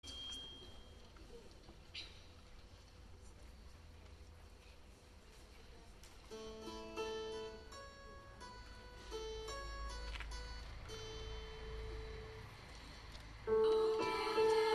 Unterstufenchor; Au clair de la lune Aus Frankreich Traditional

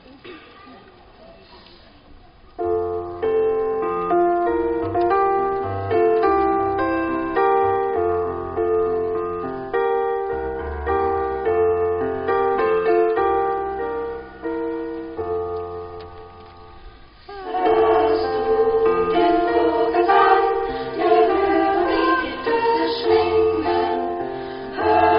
Unterstufenchor

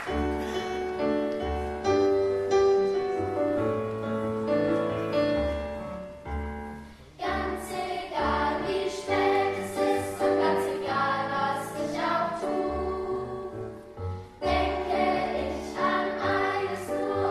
Unterstufenchor; Schokolade; Helmut Maschke

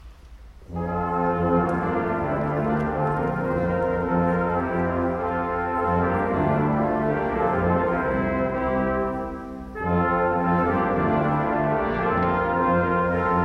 Blech; Tilman Susato; Renaissance Suite; Bransle Quatre Bransles; Konzertmitschnitt vom 19. Dezember 2023

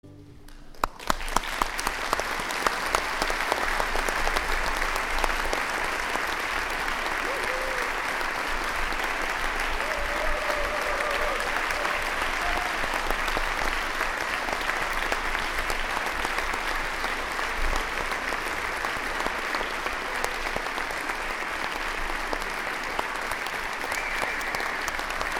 Unterstufenchor Applaus

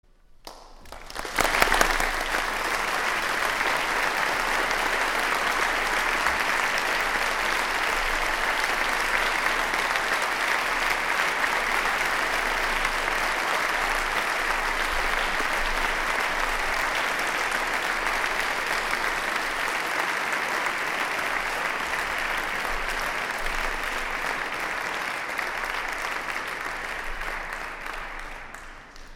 Orchester Largo Applaus

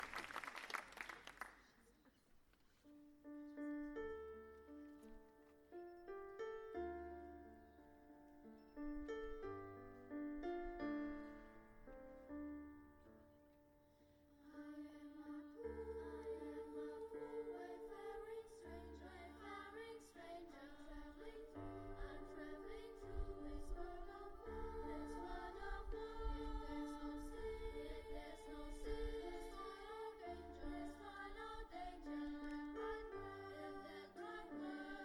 Unterstufenchor Traditional USA; I am a Poor Wayfaring Stranger

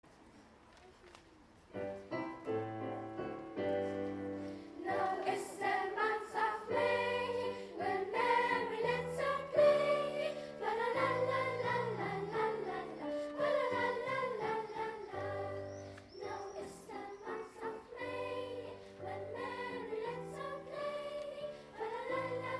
Unterstufenchor Now Is The Month Of Maying; Thomas Morley

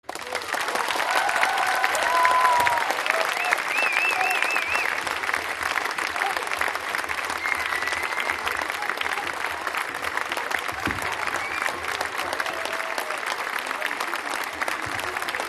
Schlussapplaus

